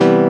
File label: SYN, dance; Sound & Vision - Gigapack II CD 1